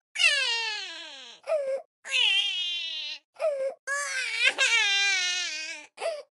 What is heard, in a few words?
crying; sago mini